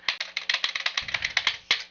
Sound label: clicky clicky